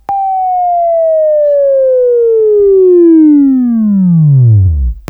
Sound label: WAV Right, Sweeping; Sweeping Training program